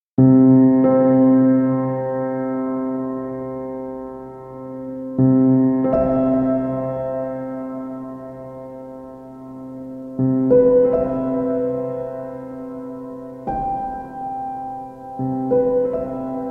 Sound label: Piano Sounds